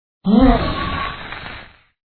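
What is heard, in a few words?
occultist attack voice bottom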